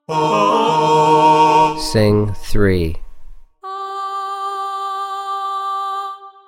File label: D# minor 3 Voice Alto; contextual ear training voice edition